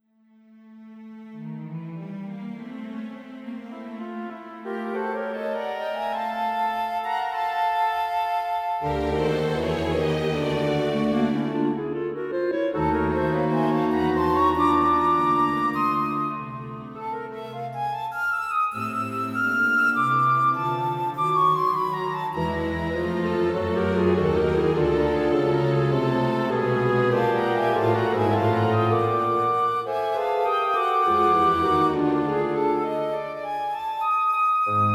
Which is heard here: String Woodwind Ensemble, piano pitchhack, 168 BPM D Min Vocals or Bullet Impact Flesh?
String Woodwind Ensemble